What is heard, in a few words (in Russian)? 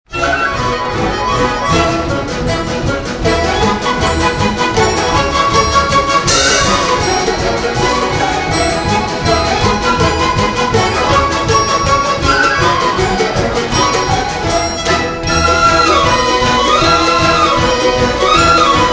Оркестр башкирских народных инструментов; Чабаны; народный башкирский